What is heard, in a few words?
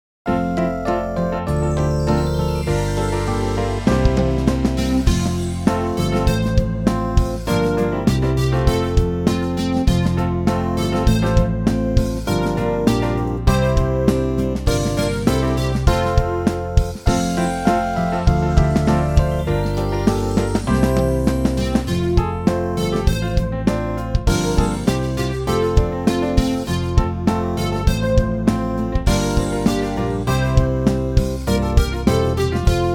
instrumental